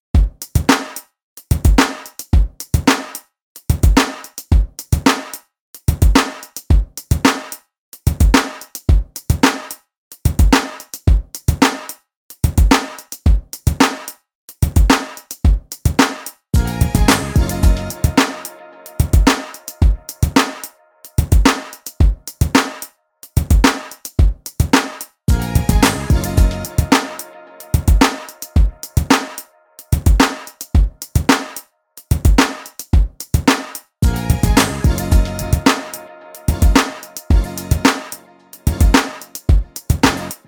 beats loop track bpm 110

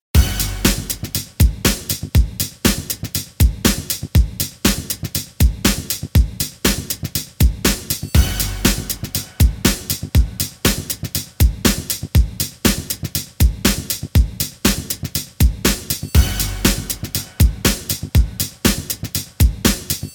beats loop track bpm 120

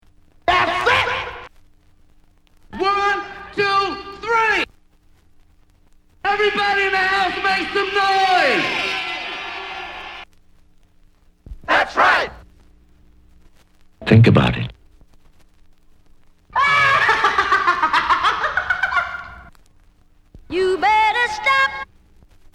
samples scratches; wicked mix vol 028

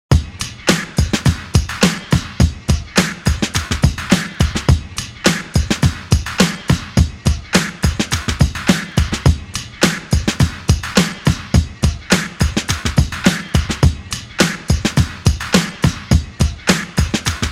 105 125 bpm

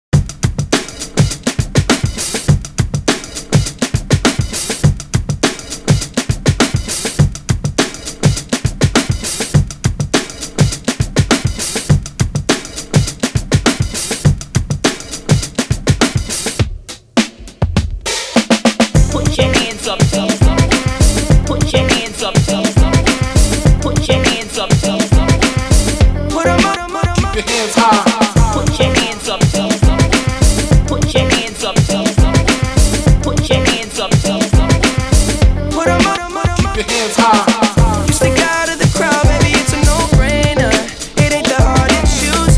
bpm 102

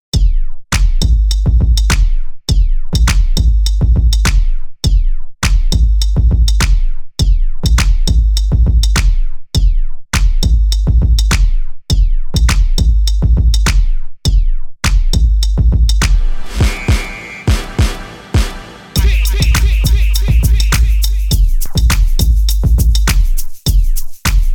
beats, bpm 102

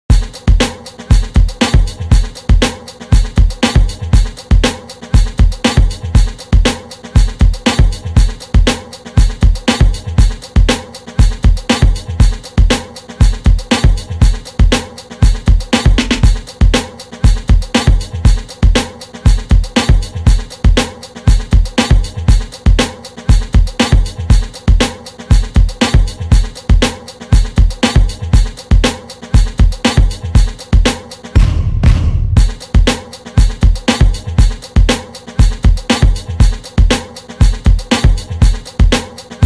beats loop track

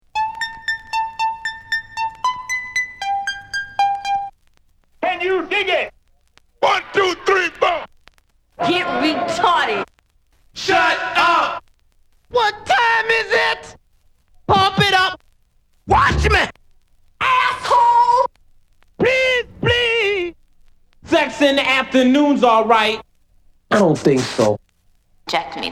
samples scratches

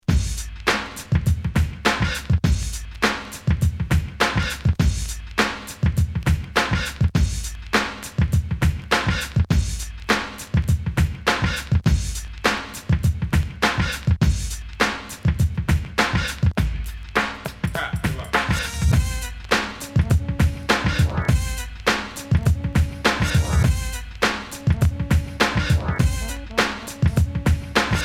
beat; wicked mix vol 013

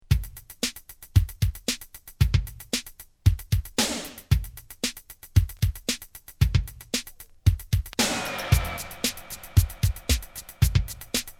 beat

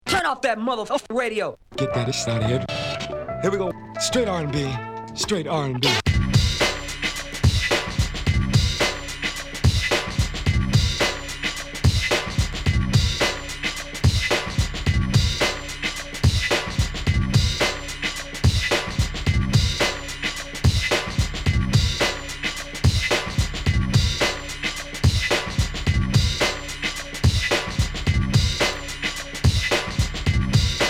beat; wicked mix vol 009

